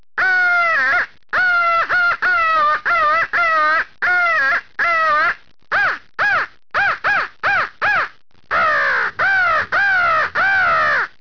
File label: fightcombo, crow